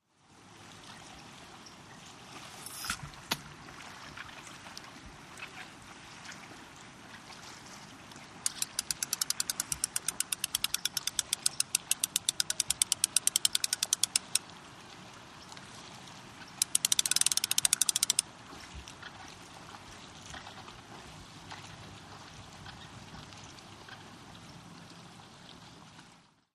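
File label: Fish Reel Short Cast, fishing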